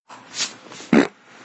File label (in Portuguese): peido do gnomo; gnomo meme